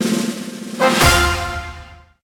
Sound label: Rank Up